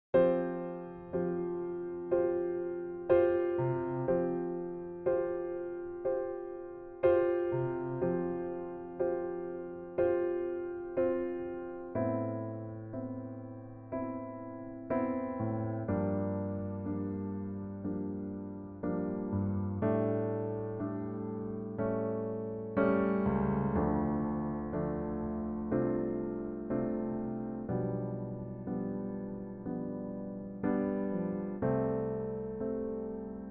F piano; key - F - vocal range - D to Bb; Wonderful piano only arrangement

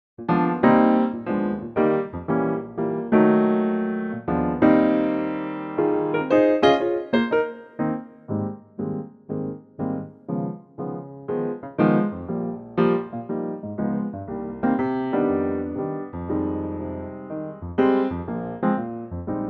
Eb piano; key - Eb - vocal range - F to G; A lightly swinging piano arrangement of this classic standard

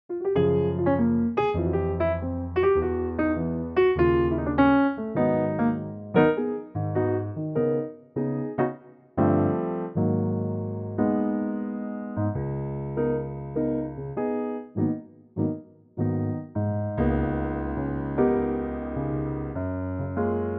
Gb piano; key - Gb - vocal range - Db to Gb

